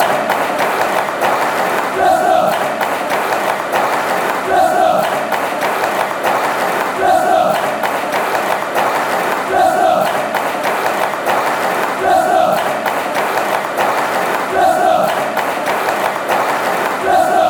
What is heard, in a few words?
clap; leicester